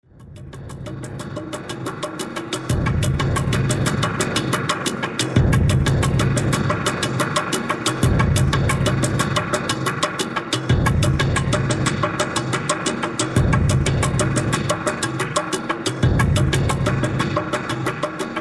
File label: kitchen Tribe Mix; Unnatural